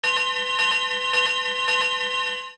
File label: Lottery Bell